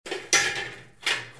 Bruitage sur etabli; Outillages

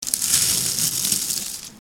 Feuilles mortes

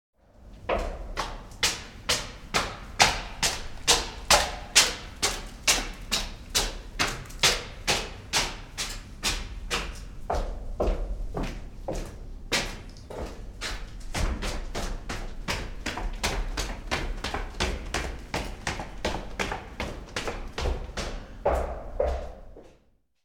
Monter et descendre un escalier

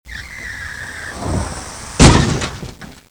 Voiture crashée

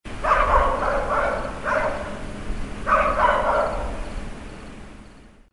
Chien au loin, Animaux; bruitage

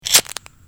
Briquet; bruitage; Electro-menager

